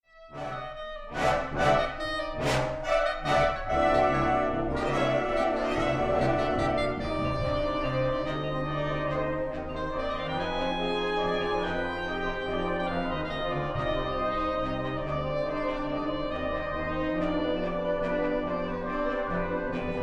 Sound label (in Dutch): Concert voor Sopraan Sax en Fanfare